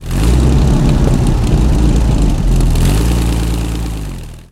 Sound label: comet engine load, tanks; engines; War Thunder